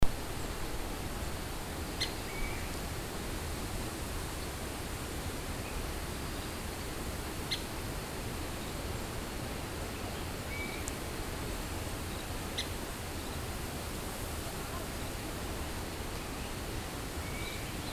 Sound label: woodpecker grey-capped pygmy Dendrocopos canicapillus